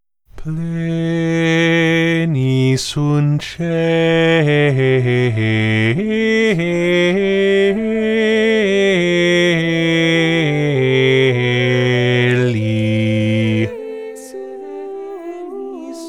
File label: BASS